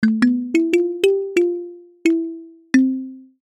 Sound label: Logo Xylophone Melody; Sonic Logo Sound Effects; Production Element Imaging Element Accent Transition